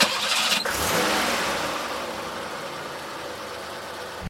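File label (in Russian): резвый мощный старт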